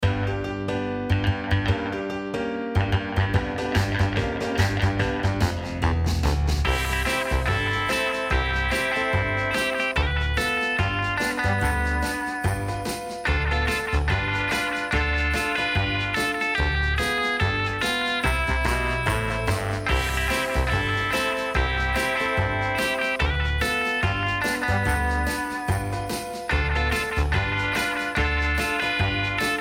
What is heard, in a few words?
backing track